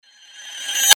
Sword Sound; FXHome; Fighting Sounds

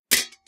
plates hit; Kenney's Sound Pack; Foley Sounds; Plating

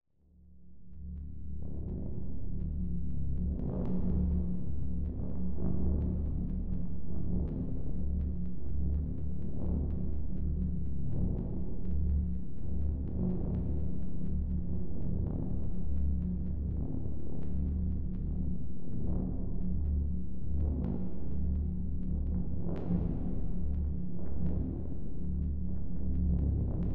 Magnetic Field; 99Sounds; Magnetic Fields

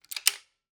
Grabbing BB Rifle; Sonic Bat

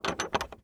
Bolt Lock; 99Sounds; The Warehouse

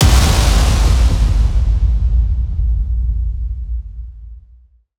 Dramatic E, Trailer Hits & Impacts; Federico Soler Fernández; Effective Trailer Hits & Impacts

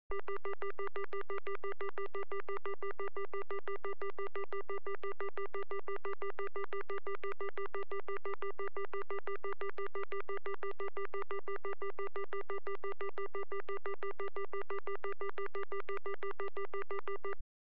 BT Lines AND Tones, Dial Payph; Computers Printers Phones; BBC Sound Effects Library

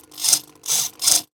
servo movement; Sound Design Tools; Raw Foley

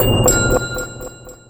BONG Bell Timer Hit Deep; GameBurp; 2000 Game SFX

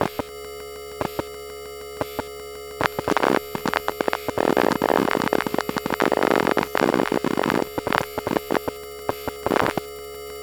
Hum Buzz Tonal

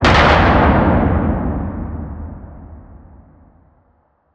Distant Blast; Lukas Tvrdon

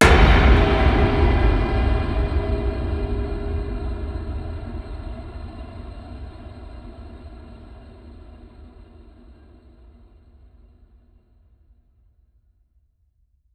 Metallic Hiss, Cinematic Impacts; 99Sounds; Rumore Cinematic Impacts

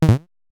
error, Interface Sounds; Kenney's Sound Pack

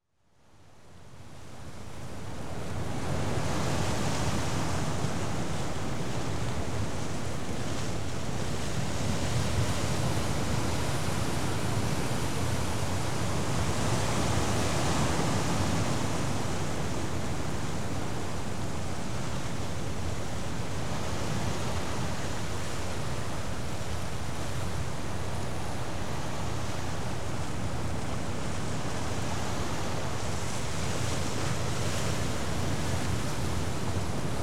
Seawash, Sea From Cliff Top; Rivers Streams Water; BBC Sound Effects Library

